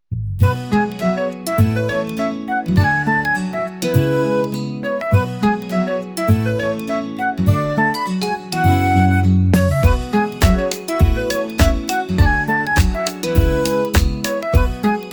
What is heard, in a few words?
morning bright